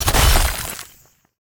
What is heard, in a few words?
Cryogen Phase Transition Crack